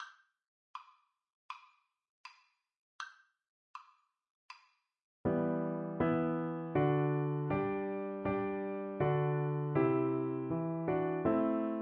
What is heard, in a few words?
emmanuel, recorder